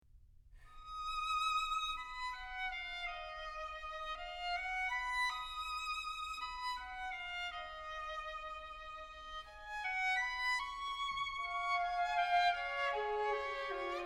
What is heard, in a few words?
String Quartet; String Quartet No. 2